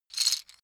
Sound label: lego bricks handling single